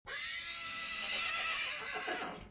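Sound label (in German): Pferd wiehert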